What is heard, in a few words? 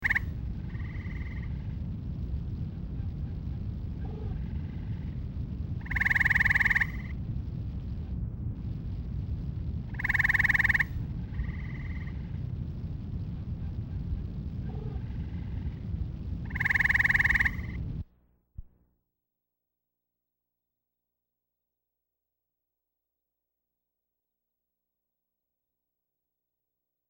Common Gray Treefrog; wildlife